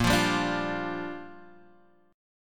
A sharp 6th; A#6; strummed; x,1,3,0,3,1

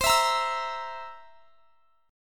C 7th C down, C7; strummed

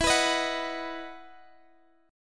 B Major E; B/E; strummed

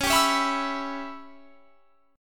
Db Minor 7th Flat 5th Db down, chord; strummed

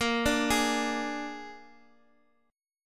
G sharp Suspended 2nd Flat 5th, guitar; x,x,x,3,3,4

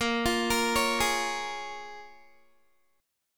A sharp Minor 7th A sharp Guitar, chord; A#m7; arpeggiated; 18,20,20,18,20,x

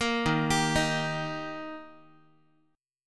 D sharp Major D sharp Guitar, chord; arpeggiated; 13,11,10,13,x,x; JGuitar

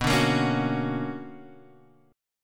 B Minor Major 9th

